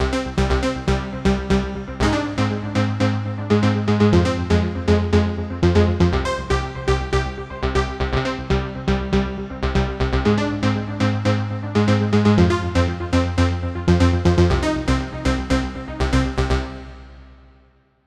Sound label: Arpeg city; KORG OASYS; oasys assault